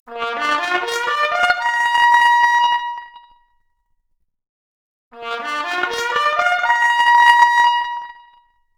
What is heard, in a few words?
Trumpet arp, Stereo output noised; 3bit